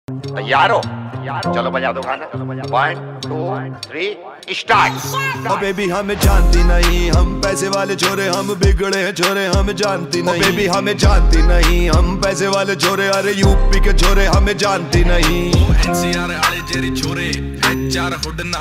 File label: Ringtone